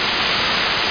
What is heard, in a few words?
ski; NewSurfs